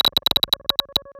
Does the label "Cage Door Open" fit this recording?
No